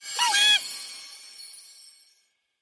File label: random Wilds Shoot Out; finn